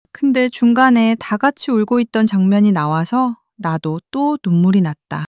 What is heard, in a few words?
crying long crying